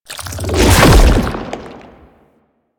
Proto Infested Babau Tentacle Flail Start, Enemies